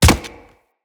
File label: Step Default, Footsteps; Infested; Charger